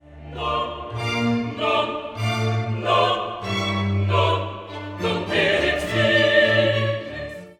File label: Credo Non non